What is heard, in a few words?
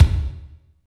KICK, Tekno Industrial; East West